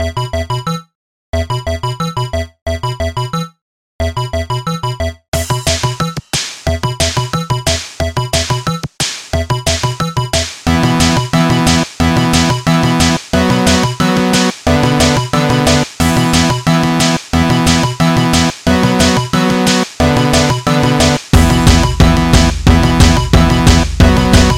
Electro

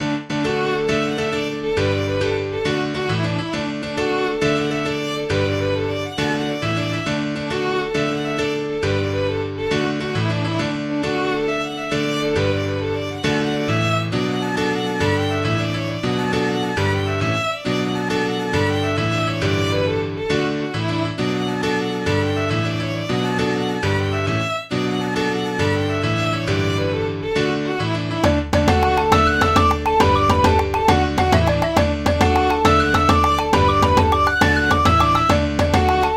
Jig

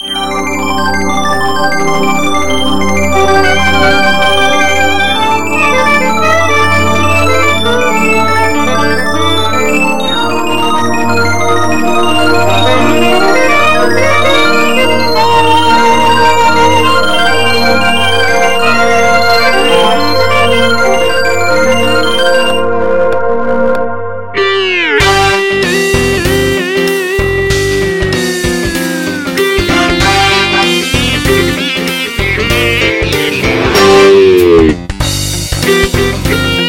Funk Hard

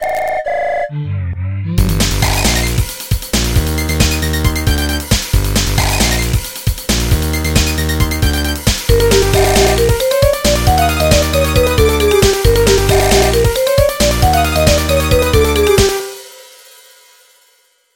Classic Ringtone; Motorola Hellomoto Classic Ringtone

